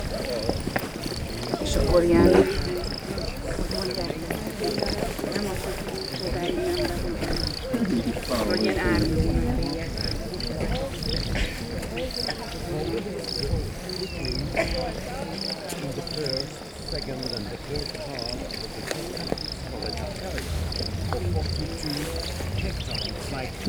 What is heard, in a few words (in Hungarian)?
romvaros latogatas vege